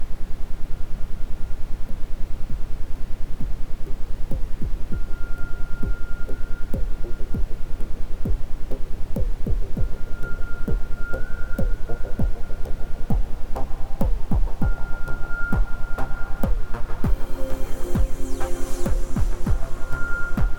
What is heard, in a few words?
isochronic, Ambient Sound; Gute Laune Spirit; isochrone toene